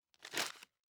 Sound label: Move Paper